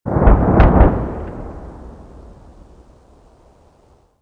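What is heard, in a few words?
explosion distant; amb elements; modern warfare 2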